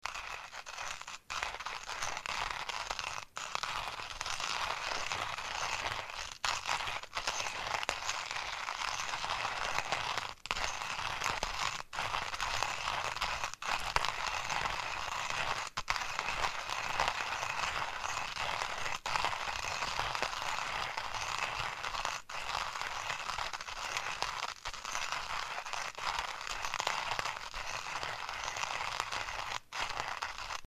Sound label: coffeemill